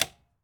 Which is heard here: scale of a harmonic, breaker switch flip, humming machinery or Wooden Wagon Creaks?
breaker switch flip